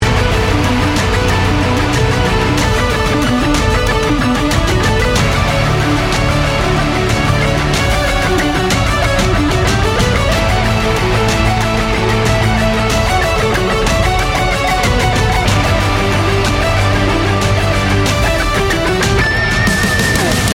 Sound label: Instrumental